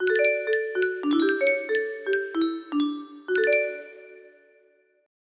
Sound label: music box